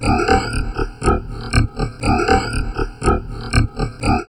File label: VOCOD, Drum Loops Crazy Processed